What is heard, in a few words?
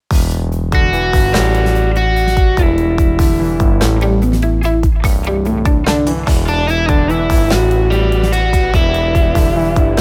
cinematic atmospheric guitar music